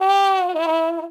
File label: Trumpet Missed note; The Princess and the Frog; wii windows